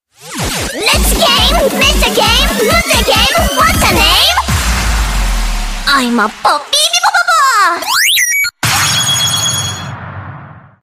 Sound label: pipopapo game tone